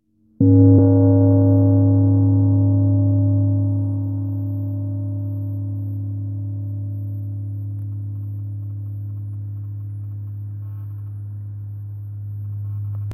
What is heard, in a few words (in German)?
TamTam